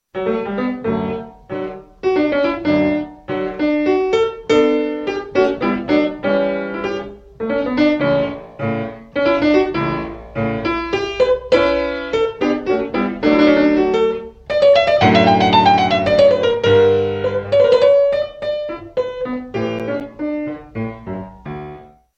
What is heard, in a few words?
piano forte; instruments